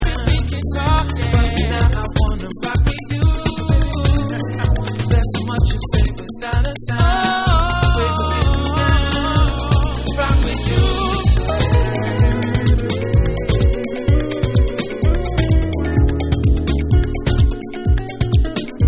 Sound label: Soul Main Mix